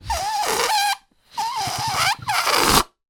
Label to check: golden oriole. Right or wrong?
Wrong